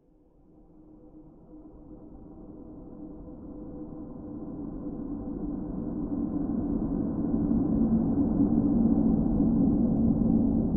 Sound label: Swell Airy Toxins Low; Sound Design; Swells